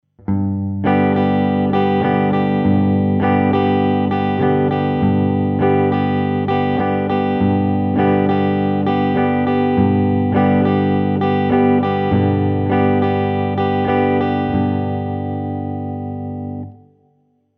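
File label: Basic Right Hand Strum Pattern, guitar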